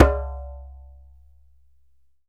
DJEMBE; E-MU Producer Series Vol. 7 – Old World Instruments

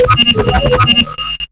SEQUENCOR, Hard & Loud Techno; USB Soundscan vol.01; AKAI

